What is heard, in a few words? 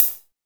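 hi-hat, drums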